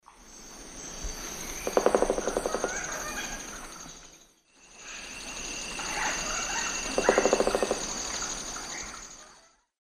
Dryocopus lineatus, drum; S. America; Eastern Lowlands

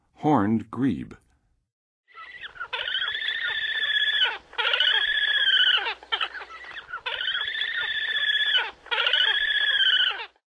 Horned Grebe; North America; Western USA; Western USA Songbirds

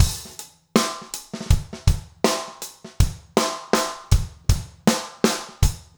trip hop

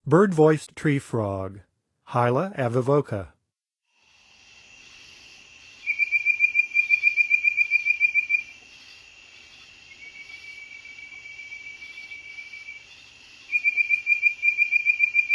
Bird-voiced Treefrog; North America; Songs of Frogs & Toads of North America

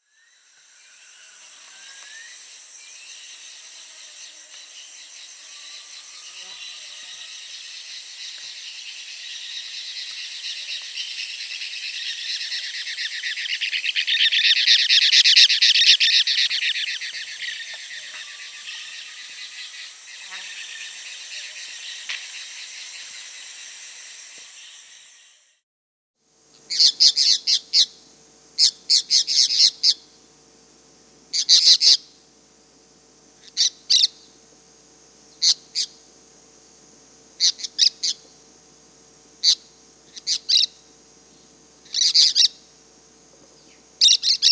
Cobalt-winged Parakeet; S. America

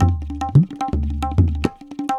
tabla funk 110

